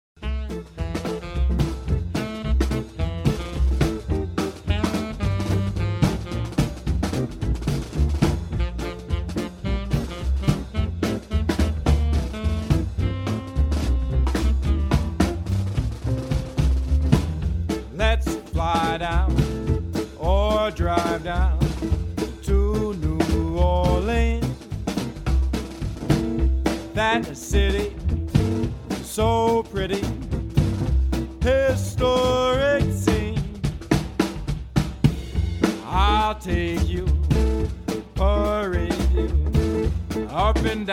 New Orleans Favorite Vocals Sax Trombone Upright Bass Drums